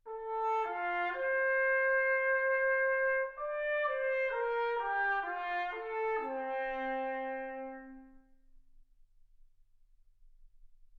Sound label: trumpet